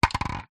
vehicles bicycle helmet plastic set down drop ground